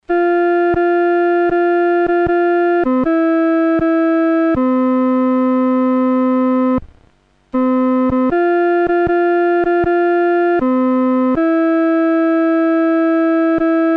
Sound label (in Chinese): 独奏 第二声